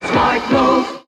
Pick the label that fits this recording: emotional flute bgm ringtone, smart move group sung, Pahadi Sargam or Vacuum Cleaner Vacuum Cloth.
smart move group sung